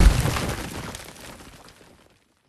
boulder impact hard

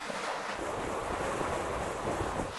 body medium scape smooth loop

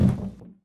Rubber Tire Impact Hard

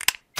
Splint Click

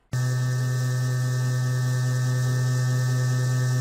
Minigun Spin

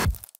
Flesh Impact Bullet

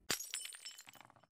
Cup Break

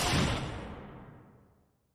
Railgun

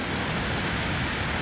Flame Thrower Loop

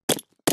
Metal Grenade Impact Hard

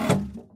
Plastic Box Impact Hard

